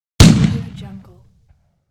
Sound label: 12 gauge gunshot